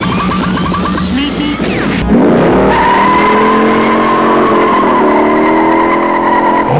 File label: beeper